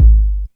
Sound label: Kick Electronic